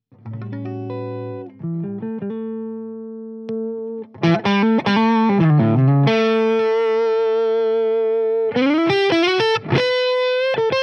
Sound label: Tube Factor